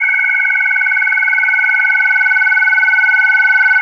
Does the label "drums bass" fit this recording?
No